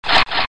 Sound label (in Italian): suoneria botton; beep